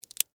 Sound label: Wrist Watch Clasp, Foley, Wrist, Watch, Clasp, Close; Crinkling; Objects; BlastWave